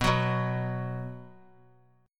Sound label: B 5th B, chord; strummed; x,18,21,21; JGuitar